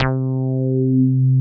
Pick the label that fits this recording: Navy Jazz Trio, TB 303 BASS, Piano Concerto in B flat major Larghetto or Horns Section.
TB 303 BASS